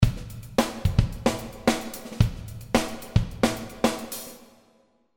Hall Drums

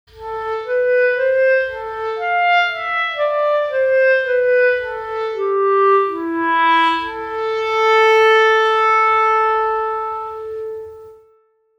Solo Clarinet

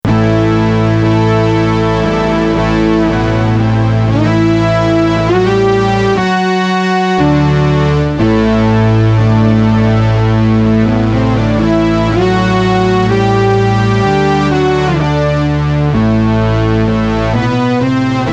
Preset OBX

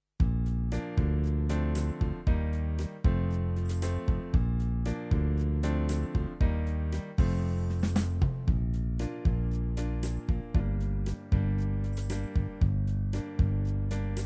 DGX650 Style 3

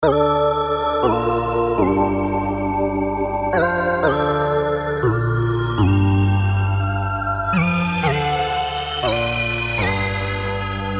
DnB; 174

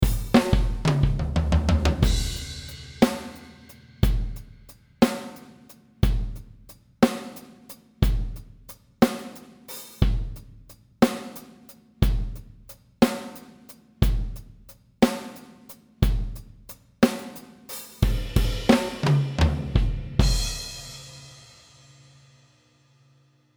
Big Funk Kit; Superior Drummer 3

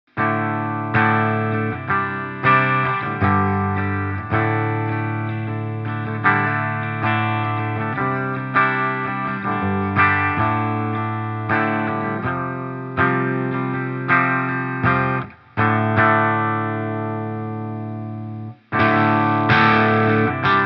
Royal Flush Bypass Boost Gain 10h

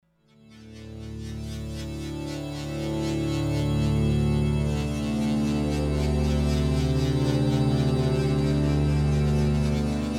Mayer MD900 Digi Pad

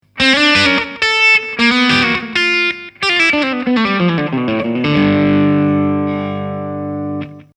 Slapback Delay

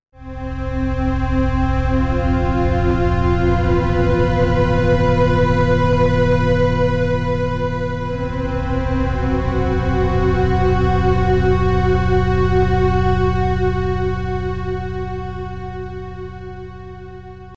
Alien Strings

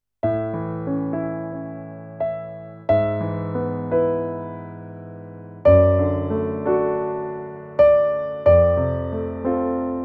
Roland FP-10 Piano

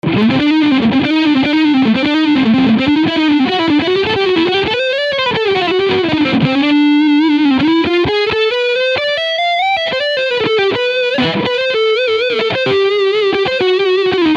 Dean MAB4 Leadsound Neck Pickup; Michael Batio

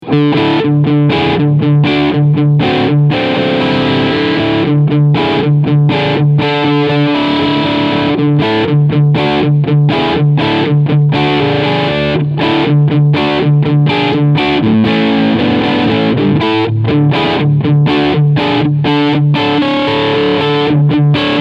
PRS CE 24 Crunch Sound Bridge PU SC Modus; Dustie Waring

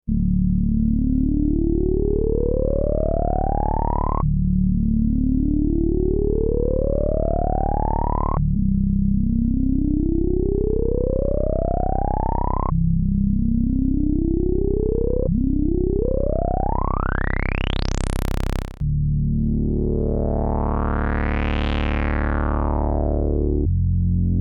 Sweep Bass

